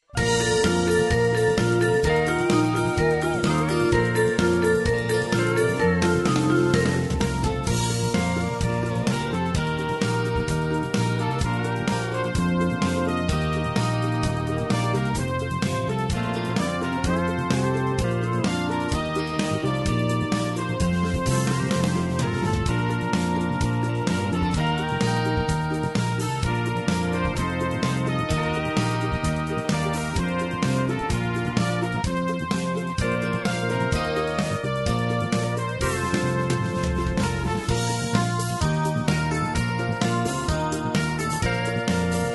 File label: hoedown, square dance music